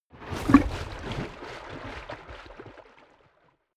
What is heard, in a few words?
waves glug intense